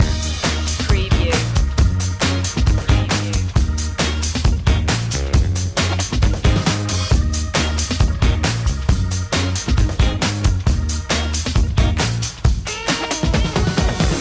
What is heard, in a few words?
the funky stuff loop